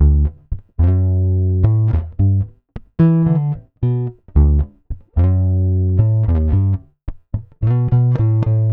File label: Pop, Bass